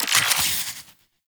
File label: Infuse Corrosive UI; Warframe; Warframes; Alchemist